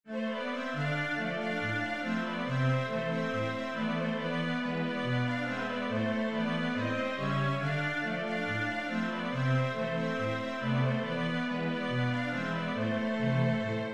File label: basso full